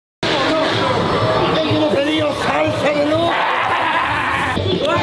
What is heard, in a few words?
salsa; deluxe